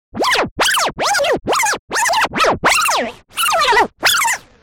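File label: Scratch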